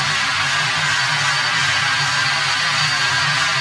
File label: Vacuum; Thalassophobia Restored